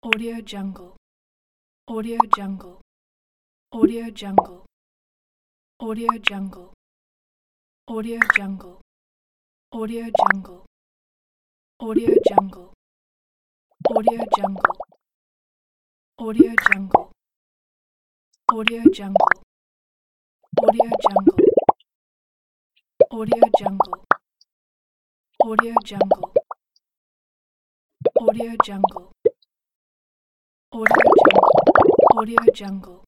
bubble pop sounds

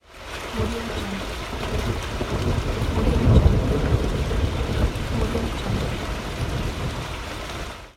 rain and thunder; andretusch

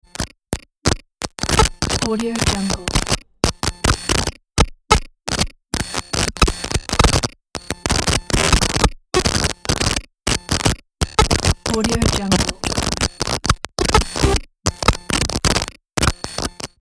glitch cuts

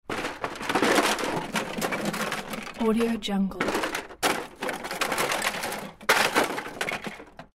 plastic junk noise and rattle

